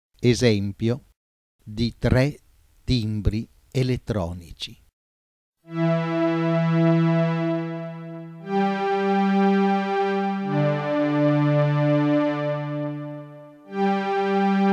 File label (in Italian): Timbri elettronici, 3 timbri di natura elettronica